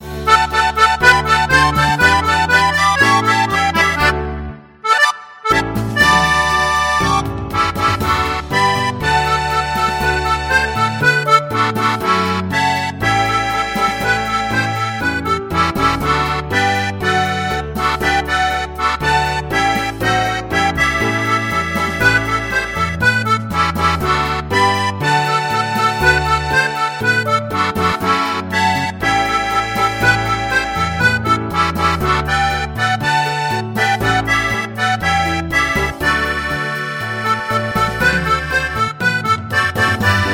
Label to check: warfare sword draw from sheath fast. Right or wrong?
Wrong